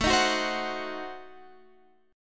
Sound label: A Diminished 7th, Guitar Open F; Adim7; strummed; chordshape